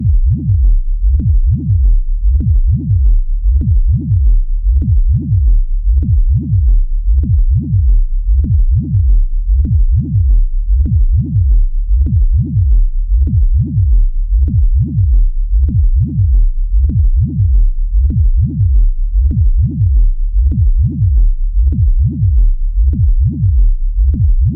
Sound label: techno